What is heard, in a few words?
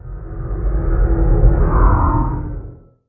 elder idle, guardian; minecraft; mob